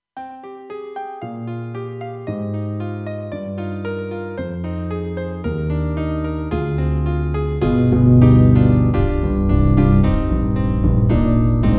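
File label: Piano and Wood Bass; KAWAI GMega LX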